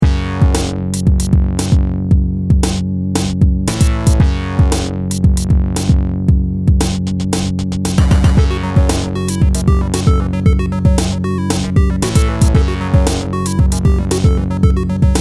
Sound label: boss level; steamcommunity; towerattack; SteamMonsterGame